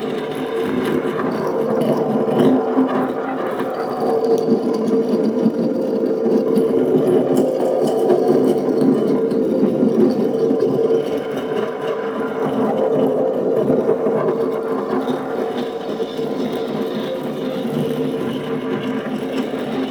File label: Small Rotating Glowing Rock Loop; Ambience; Warframe; Entrati; Dead End Secret